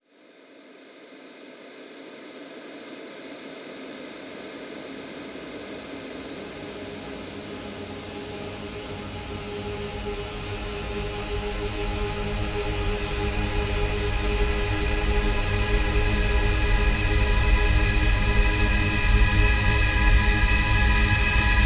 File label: 128BPM Trance Swell 16Bars G#, Melody Fills; Vengeance Samples